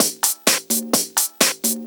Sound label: Latin Loop 128 BPM